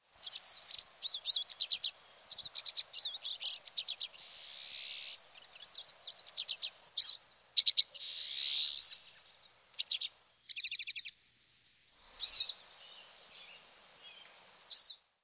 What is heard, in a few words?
pine siskin